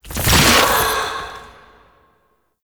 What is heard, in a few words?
Protective Shell Charge; Warframe; Citrine